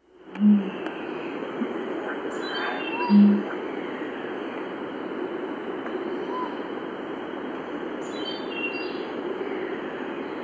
Displaying male, Birds of the Costa Rican Cloud Forest